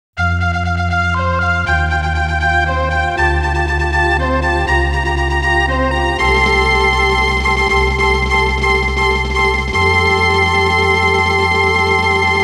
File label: Fanfare; koei original bgm collection vol.13; winning post; winning post 2; Kanto G1 Race